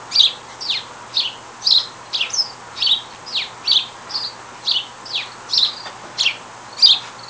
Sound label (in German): Haussperling